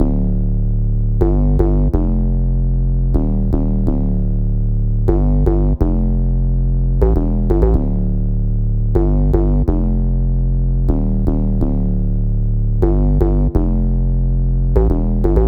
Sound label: bass Dm 124